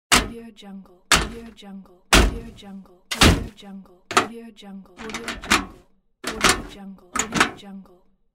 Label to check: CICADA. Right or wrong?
Wrong